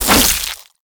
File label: Boomerang Fast Whoosh; Infested